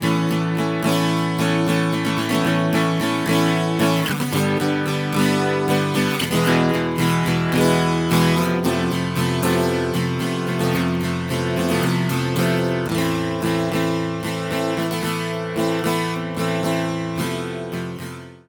acoustic gtr processed

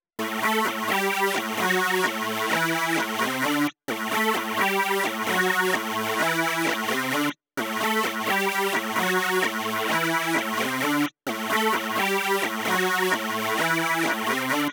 lead synth processed

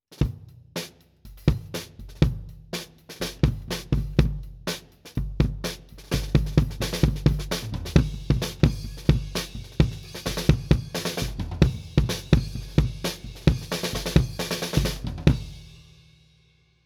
kick head on outside batter md421

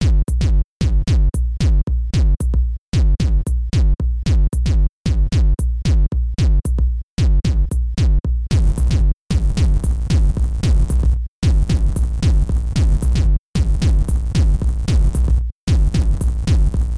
drums original

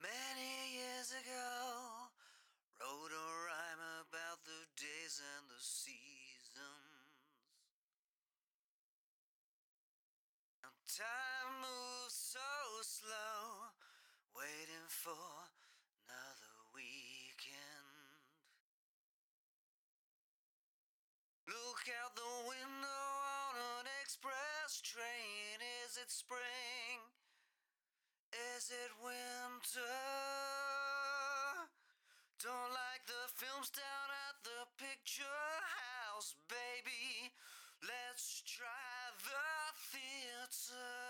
vocal parallel

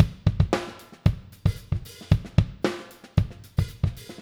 kick snare notches

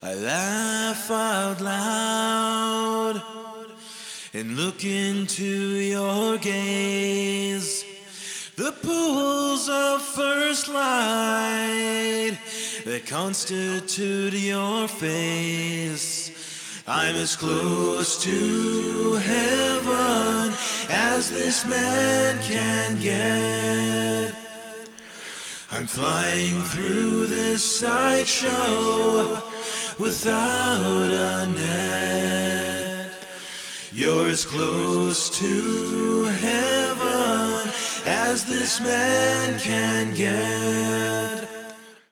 vocals mix processed

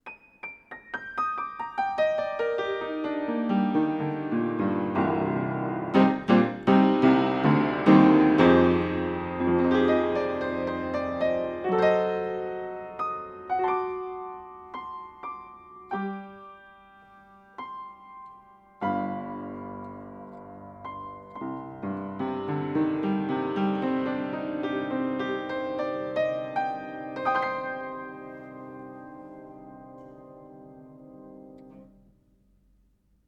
upright panels on 20cm pair

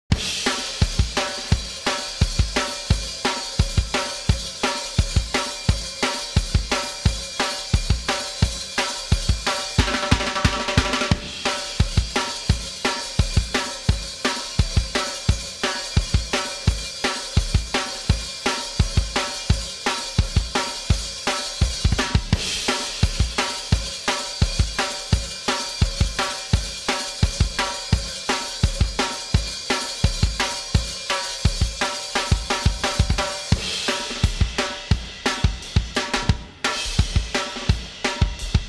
drums mix fx comp

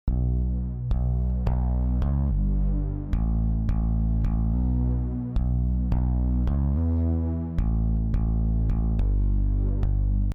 bass synth processed

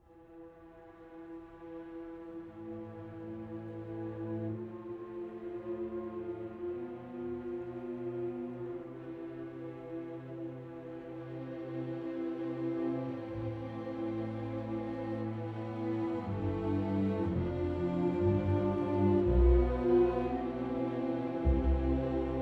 full section mix ortf spot

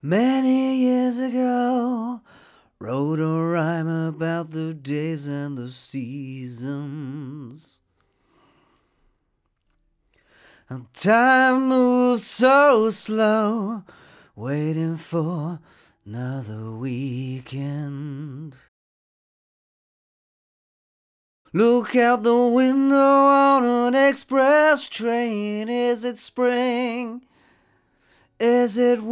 vocal original

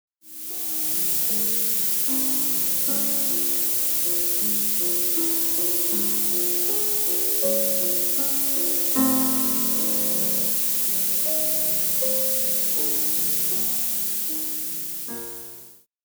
noise shaped piano

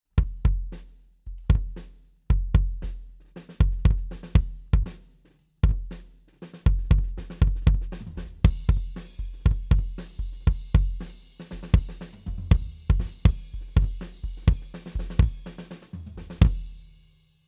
kick head off mid; D112

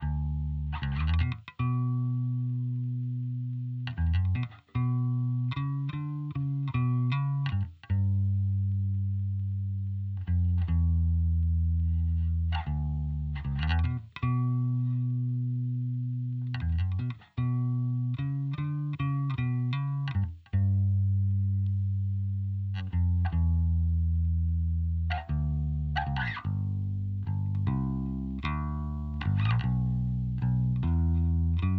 processed bass

